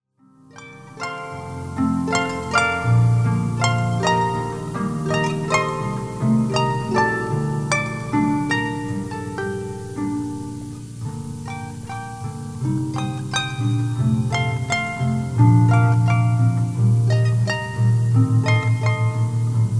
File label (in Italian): ARPA